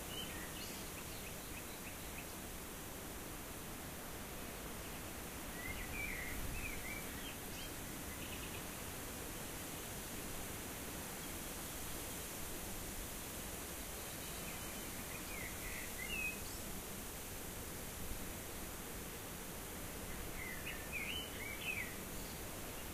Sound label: rayol farm; SEAMED